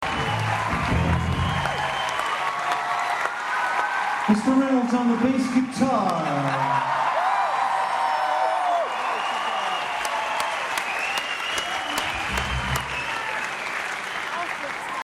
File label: Bass